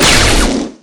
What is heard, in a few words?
Zap Bang, weapons